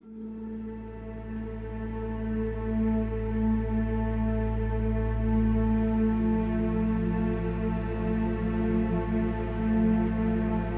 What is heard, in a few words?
Ambient Pad Soundscape